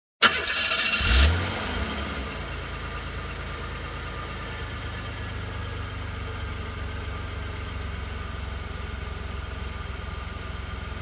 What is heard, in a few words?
Car engine running